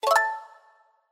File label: Reel Spin Stop Win; Symbols Of Asia